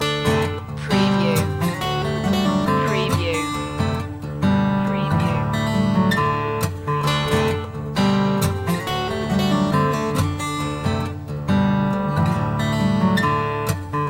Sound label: riverbed loop